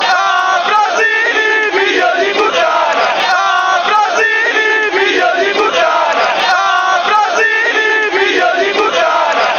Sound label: fanchants; ambrosini